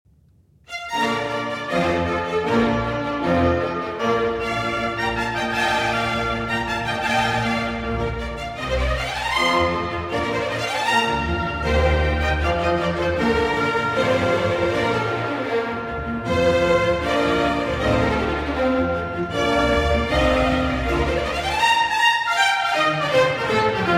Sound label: Violin Concerto No. 1 in B-Flat Major; K. 207; Mozart